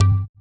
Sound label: EQ Perc, Percussion Mix